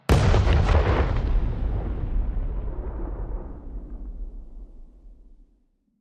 One Shot Explosion

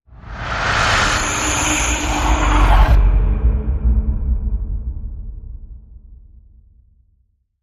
Evil Breath Boom

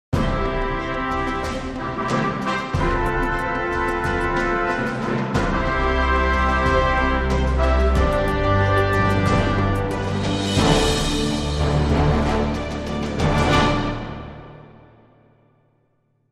Path To Success, Sting

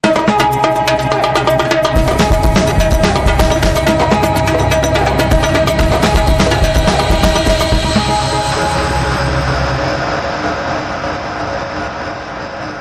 Cuica Sting

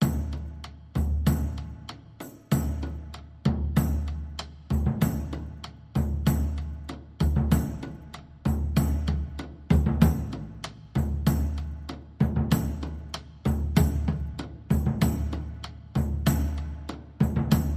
Percussion Only

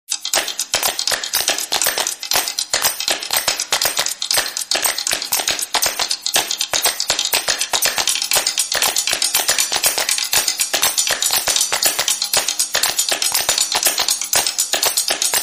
Percussion Party, Underscore

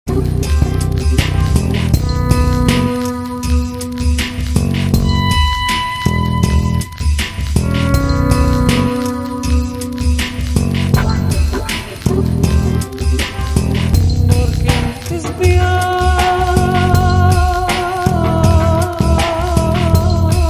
Kurdish Soul

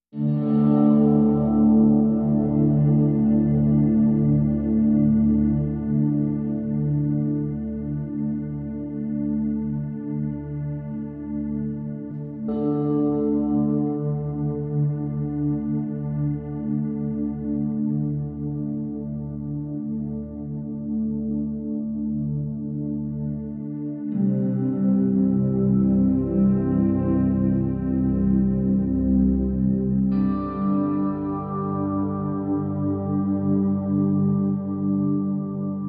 Pads Only

